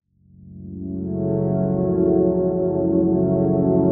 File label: 122 BPM Pads Amaj Fmin Tonic F, ambient synth pads; MMB